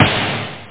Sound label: splat snare